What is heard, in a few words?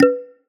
Button Blip; sound ex machina